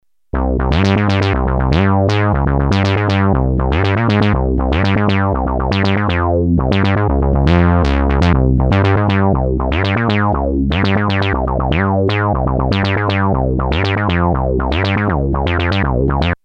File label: synth glided, Moog minitaur; wet sound; analog monophonic synthesizer; 2 VCO oscillator